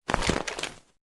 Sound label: Gunslinger Defend Sound